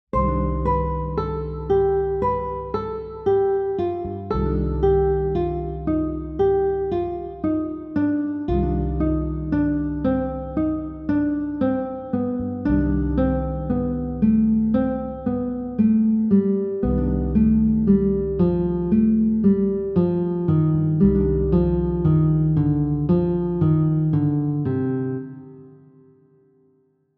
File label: 4 note descending scale pattern melodic minor, guitar